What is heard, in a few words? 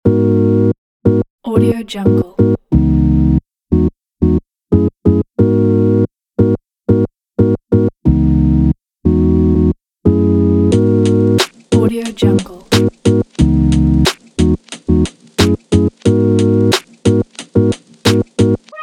hiphop